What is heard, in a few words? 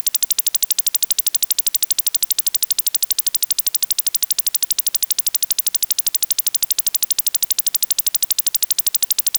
CYP OTHR 12.50 Hz PRF, OTHR-SYSTEM 12.50 Hz PULSING; Radar running at 80 kHz bandwidth; Cyprus; ALE-systems, tone calls, ionosondes & chirpsounders